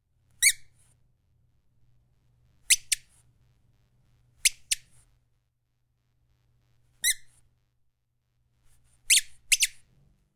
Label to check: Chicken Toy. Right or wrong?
Right